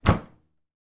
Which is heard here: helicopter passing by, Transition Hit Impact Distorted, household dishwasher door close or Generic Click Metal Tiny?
household dishwasher door close